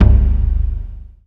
MAL PERC, MALAISIAN; USB Soundscan vol.60; Asian Traditions; AKAI

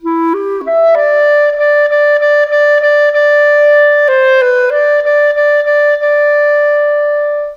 Clarinet; Sonic Foundry (Sony Creative Software); Orchestral Series VOL-4; Rock and Pop; Rock-Pop 09

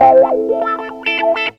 GTR, GTR RIFFS, Funky Elements; Zero-G

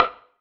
perc organic wood, percussion

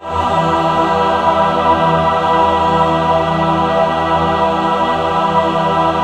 BIG MIN E4 R, BIG CHORD, Symphony of Voices

